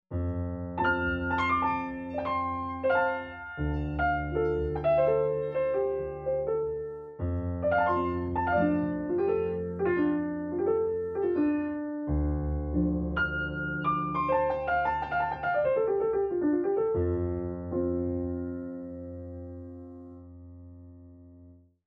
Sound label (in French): GAZOUILLIS DE PIANO